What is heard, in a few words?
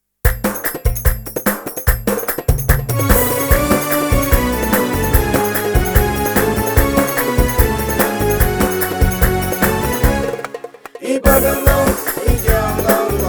ARABIC SONG